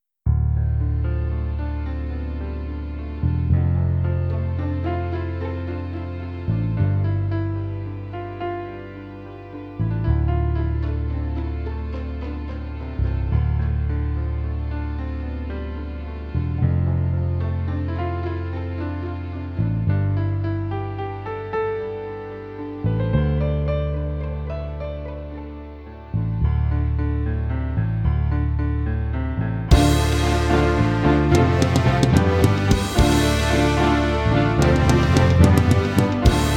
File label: Trailer; garageband; MaxKnight